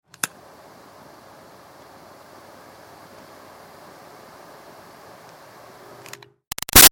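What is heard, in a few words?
Lighter Butane Torch Small Medium Far On Steady Off; Flame; Big Room Sound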